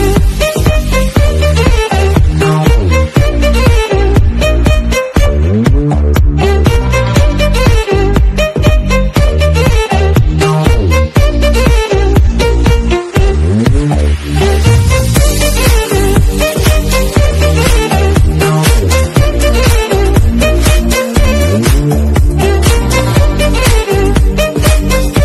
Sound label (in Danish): ny engelsk ringetone